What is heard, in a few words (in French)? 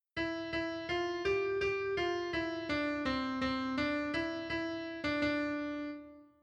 Hymne à la Joie